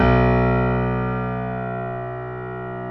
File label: GRAND PF